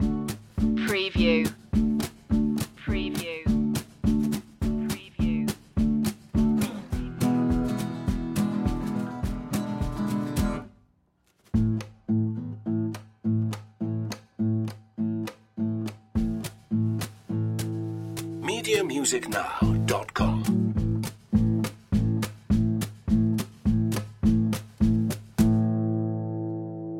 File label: country boy breakfast loop